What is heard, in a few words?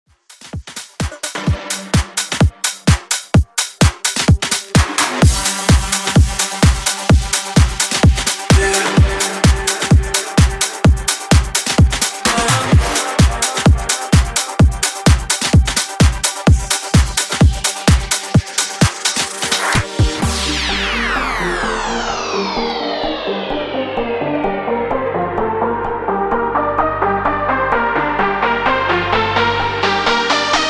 Bootleg Rework 128 Bpm; remixplanet